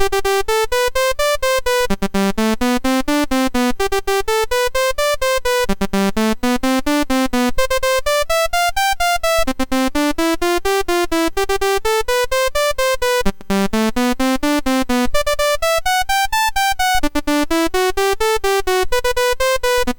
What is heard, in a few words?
Duelin Computer BASIC sid MOS6581R4